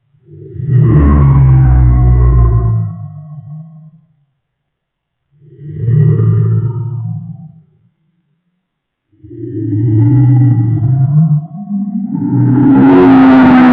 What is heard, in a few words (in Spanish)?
godzilla kaiju dinosaurio